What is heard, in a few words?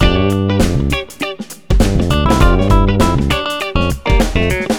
jazzy, ringtones; Google; NewWave Labs